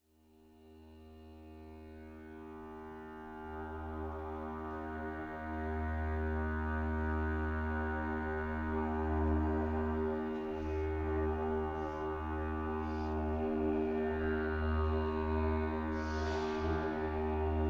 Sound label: Overtone Live